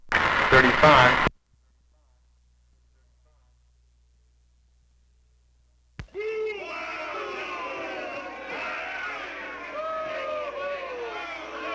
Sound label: Crowd Booing